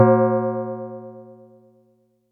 mid tome drum sample c key, Royality free tom tuned to the C note. Loudest frequency: 494Hz